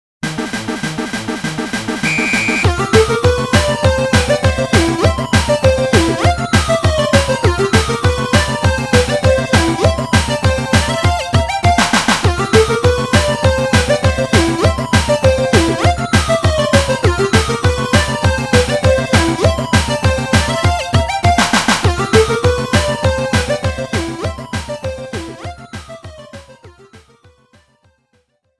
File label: barrage ring battle; Hanjuku Hero vs 3D